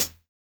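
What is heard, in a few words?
lofi hi hat; Drums In Your FACE!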